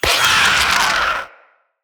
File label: Spider Vocal Pain; Vocals; Root Spider Mother